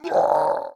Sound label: MONSTER Hurt; MONSTERS & CREATURES; mono; Universal Sound FX